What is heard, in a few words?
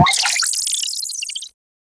a short sfx when the, gameplay